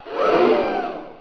gasp sound effect

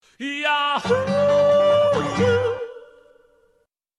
yahoooooooo

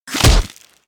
fall damage; gmod

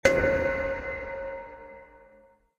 horror sting

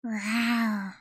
rawryy

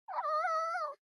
fox whine

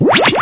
item pickup

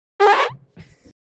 fart

